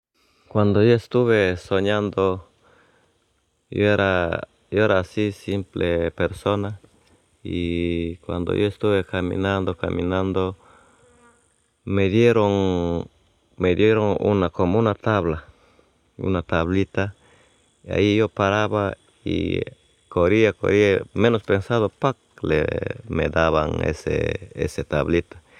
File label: tabla